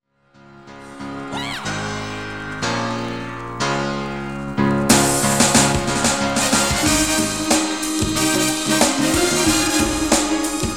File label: SWING